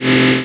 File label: Generator Loop; Playable Tutorial Level; TR3